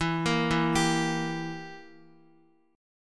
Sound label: E Diminished down Guitar; arpeggiated; JGuitar